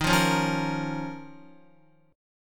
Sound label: D sharp 7th Suspended 2nd D sharp, Guitar; D#7sus2 strummed; JGuitar